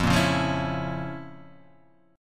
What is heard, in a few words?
F Major 7th Suspended 4th Sharp 5th F